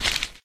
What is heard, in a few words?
grass footstep